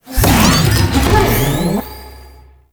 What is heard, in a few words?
Balloon Mine Charge; Warframe; Follie Frame; Abilities